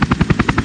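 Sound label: engine Helicopter; Falcon 40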